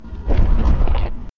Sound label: Button Pressed; lego ninjago rise of the snakes